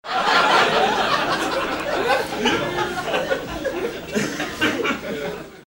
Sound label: laugh long